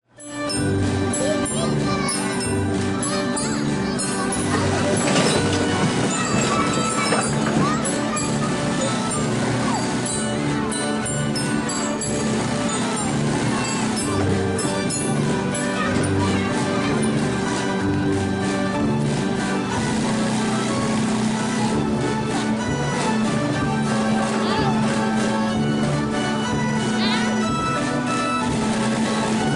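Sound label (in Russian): carousel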